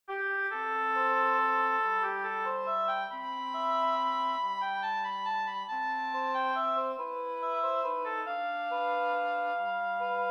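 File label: oboe trio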